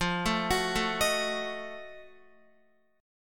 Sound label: F 7th Flat 9th F down Guitar; F7b9; arpeggiated